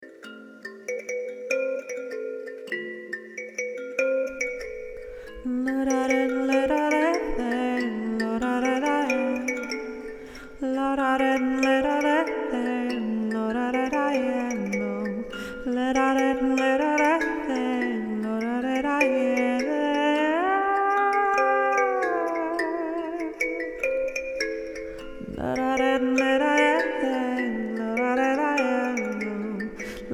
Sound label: calimba